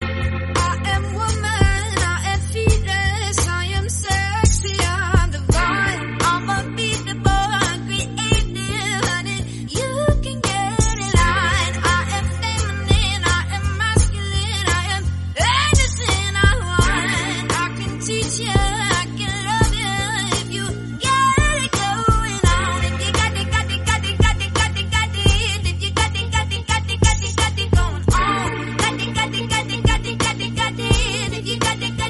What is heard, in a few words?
english song